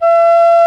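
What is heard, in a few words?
penny whistle, World Winds; E-MU Producer Series Vol. 7 – Old World Instruments; World Combos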